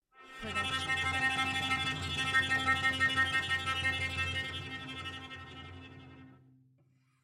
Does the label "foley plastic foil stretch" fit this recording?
No